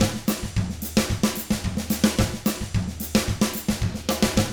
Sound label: 110 SAMBA, Drums; ILIO; Double Platinum Drums 1